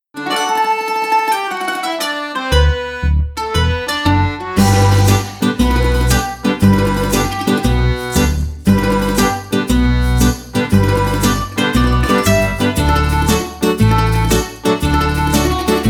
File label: italian background music; italy